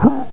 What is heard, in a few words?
pain, combine soldier, far; hl2